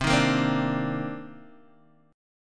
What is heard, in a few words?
C Minor 9th C Guitar DADGAD